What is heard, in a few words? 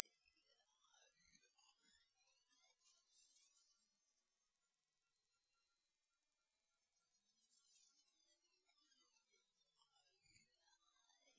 Ringtone High pitch